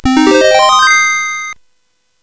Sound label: Loopz, CSG8580R5